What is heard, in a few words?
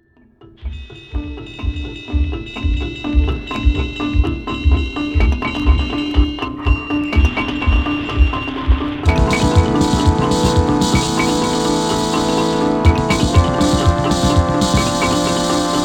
rimarimba on dry